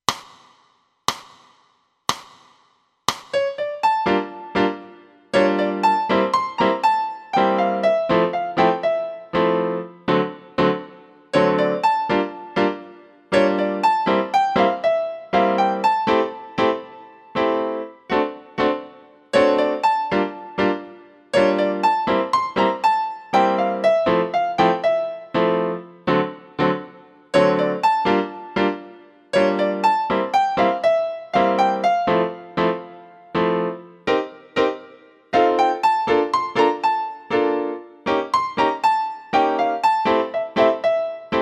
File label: piano